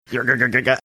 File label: making funny sounds